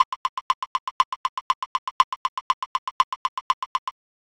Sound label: rhythmic durations